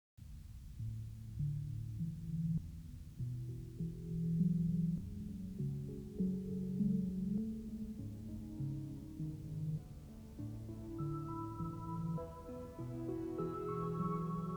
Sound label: Lofi